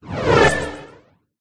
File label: block; listvennik